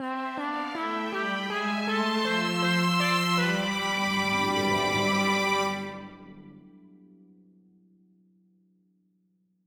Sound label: Intro 160bpm 21beats